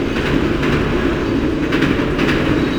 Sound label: train, chicago